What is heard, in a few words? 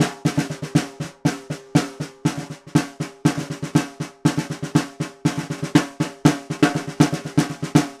Vintage Ludwig Snare, Percussion